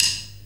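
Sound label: snare drum rim shot; 90 s Sample CDs; Best Service Dance Mega Drums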